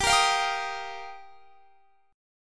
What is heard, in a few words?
G Minor 7th Sharp 5th; Gm7#5; strummed; x,22,20,22,20,23